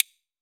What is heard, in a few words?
CASTA AMB, CASTANETS; AKAI S-Series CD-ROM Sound Library VOL-5